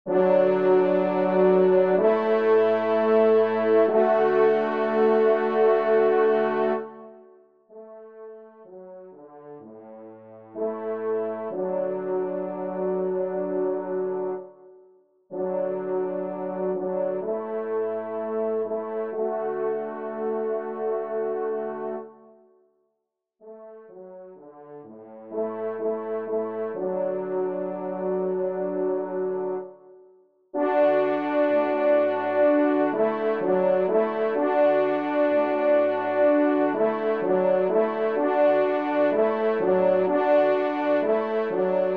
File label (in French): trompe; Nemrod; 2e Trompe; Fernand Andrieu